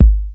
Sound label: mellow bd, Kicks; single hits